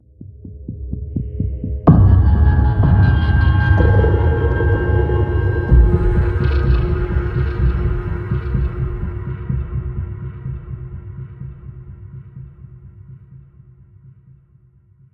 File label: Sneak